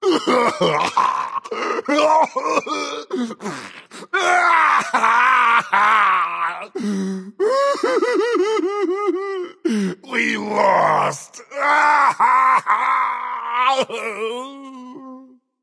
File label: boo hoo; smite